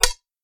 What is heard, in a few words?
Clock